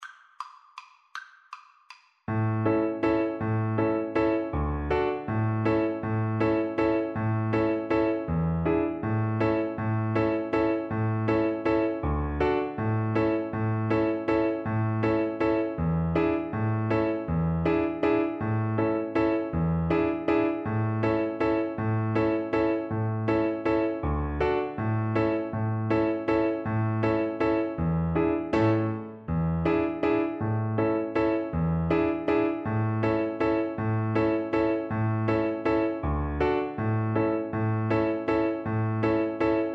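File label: VLC karaoke, Cello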